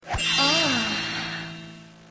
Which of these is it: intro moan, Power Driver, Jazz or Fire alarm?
intro moan